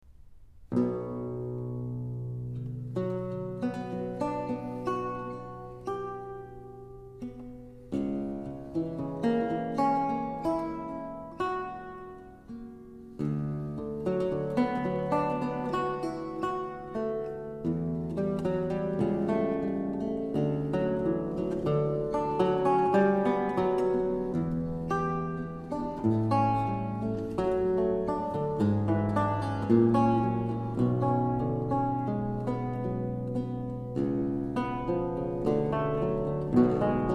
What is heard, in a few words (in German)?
fantasie